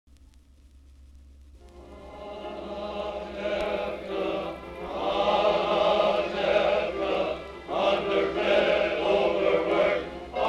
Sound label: Chorus; Anatevka